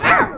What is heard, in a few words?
cute grunt